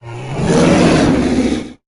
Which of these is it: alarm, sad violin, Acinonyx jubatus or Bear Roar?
Bear Roar